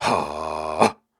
Grunts and Chants, Viking Vocal

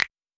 Snap; Cymatics; Eternity Sample Pack; Drum One Shots

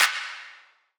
Weightless Clap; House; Drum One Shots; Claps; Cymatics

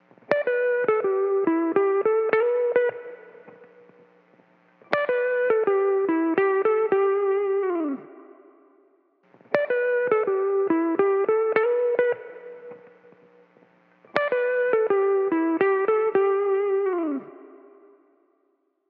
Electric Guitar Wet Part B 104 BPM E Min; Melodics; Cymatics; Eternity Sample Pack; Guitars - Electric

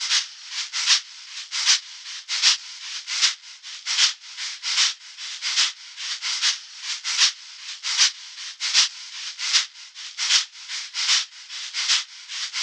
Shaker Loop, 76 BPM; Drum Loops; Lofi; Cymatics; Lofi Starter Pack

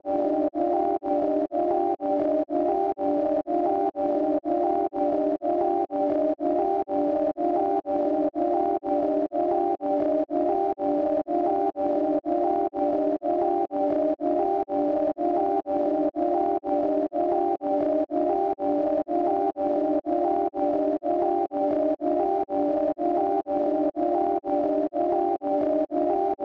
123 BPM G Min Pad Arp, melody loop stem; Loop Stems; God Fearing

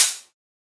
Open Hihat; Dumont; Cymatics; Drum One Shots

